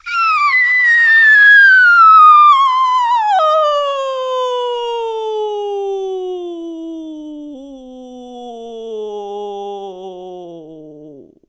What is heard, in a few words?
amateur soprano glissando; Klangbeispiel zum Artikel von Christian Herbst (Audiosignal) in der VH 3 2021 und 1 2021